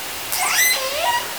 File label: call S2i (J version), OrcaCalls, SRKW; Alwin